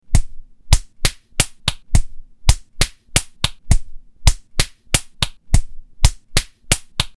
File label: punch